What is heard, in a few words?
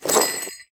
armor heavy; smite; chiron